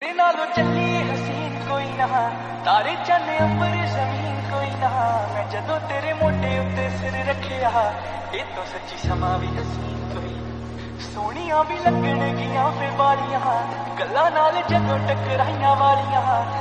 Lofi Flip

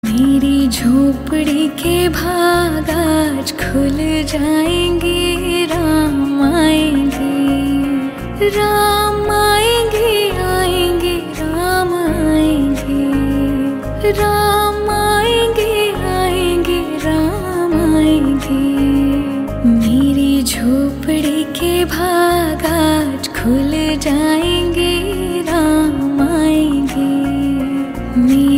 female version ringtone

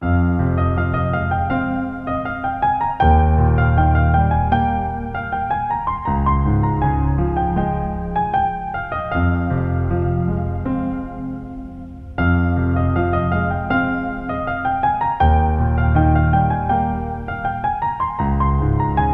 piano ringtone

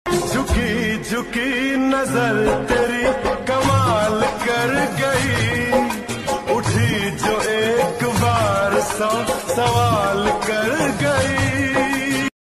Lofi Ringtone

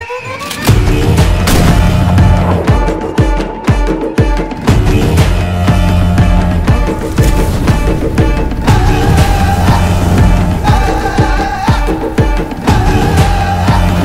bgm ringtone; mangalavaaram

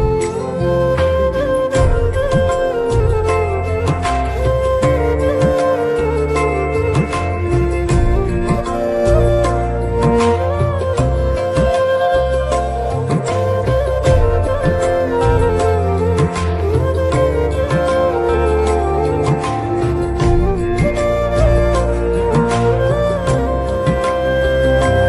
instrumental ringtone

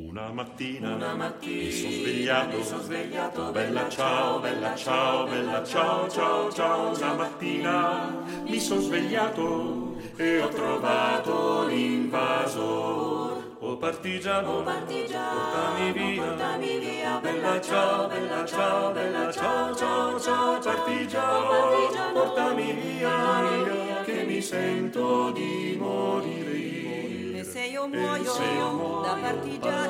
bella ciao ringtone; lacasa de papel

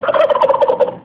turkey gobble ringtone

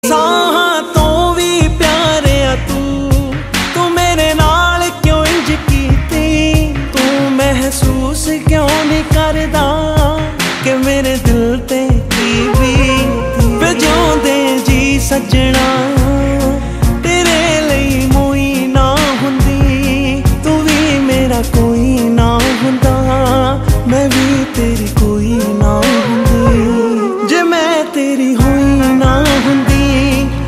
sad ringtone

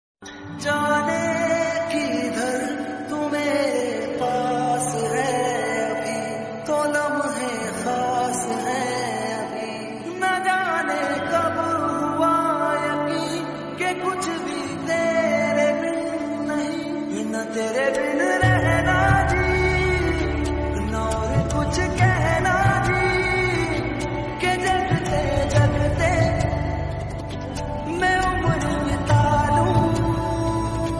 Hindi; Ek Villain

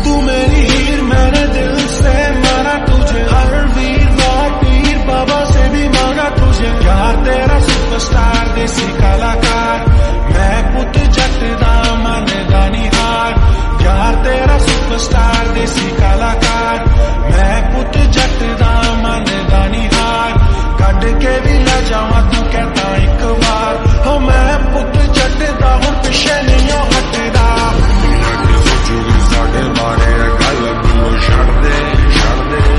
slowed reverb ringtone

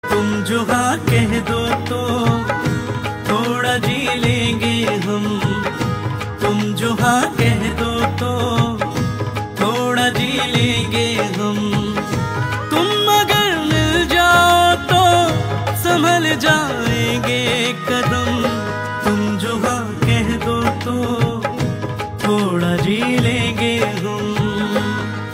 Ringtone

